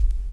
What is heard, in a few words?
DR. LOOP; X-Static Goldmine 3